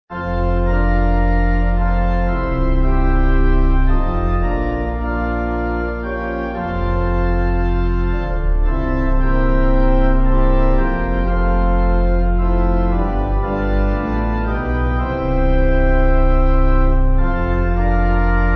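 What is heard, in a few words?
Pipe organ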